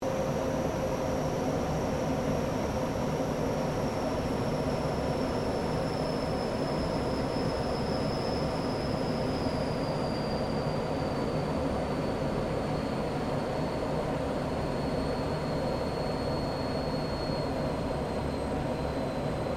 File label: AIRCRAFT GLOSTER METEOR F MK8 LANDING INTERIOR, Gloster Meteor fighter jet; Airplanes